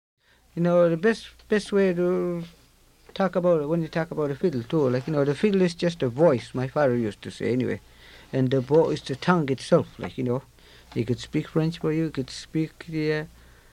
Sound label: fiddle selections; mikmaq